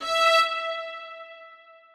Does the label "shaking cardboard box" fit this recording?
No